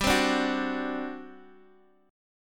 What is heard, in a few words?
G Major 7th Suspended 4th, G, down, Guitar, Half Step Down